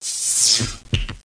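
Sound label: spell fail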